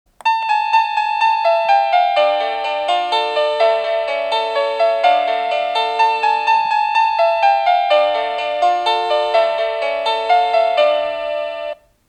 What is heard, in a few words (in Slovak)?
Ukážka melódie zvonenia zvončeka